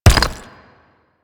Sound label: Mech Step Surface Rock; Step Surfaces; Nechro